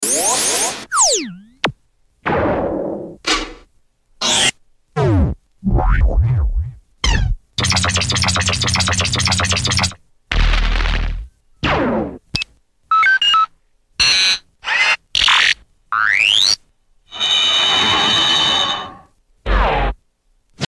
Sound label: Scratch tools